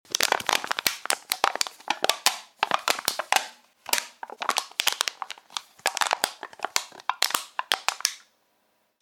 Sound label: Crushing an aluminium drinks can.; Crunches; Objects